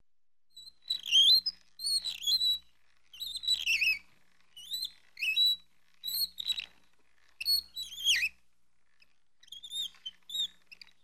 zvuki skripa

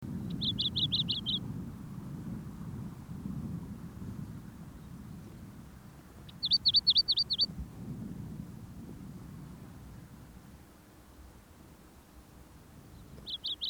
indiyskaya penochka golos

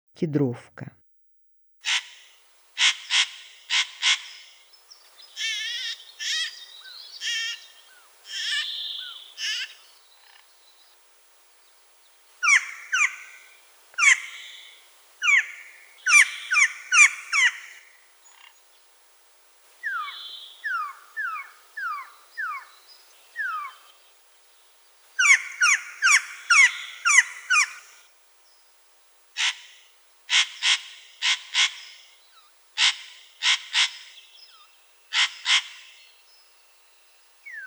kedrovka golos

